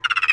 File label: Spider Sound